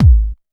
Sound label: dance